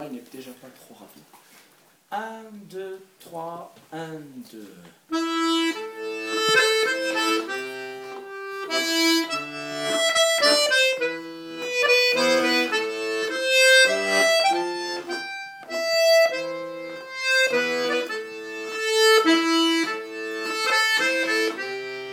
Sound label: Mazurka